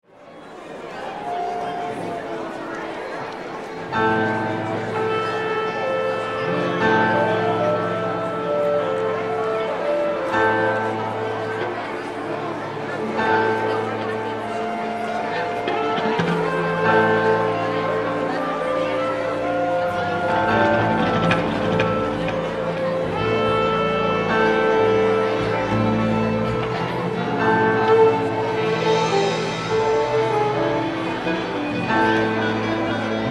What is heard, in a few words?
Ambience Concert Orchestra Warm Up Crowd; Concert Sound Effects; Concerts (Sound Effects:Backgrounds); SFX Bible 6